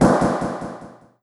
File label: teleport disappear; opensurge